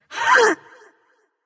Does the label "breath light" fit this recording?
Yes